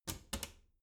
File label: bathroom cabinet doors close soft; objekte; tueren